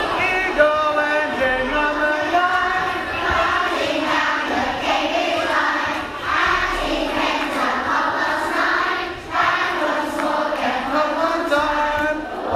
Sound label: eagle engine